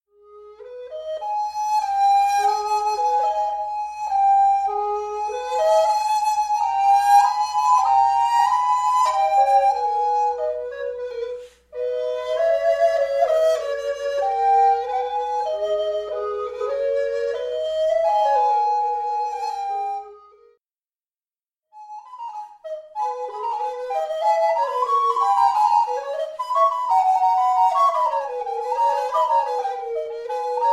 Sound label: Duet Recorder